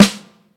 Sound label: snare sound g sharp key, steel snare drum tuned to the G# note. Loudest frequency: 1429Hz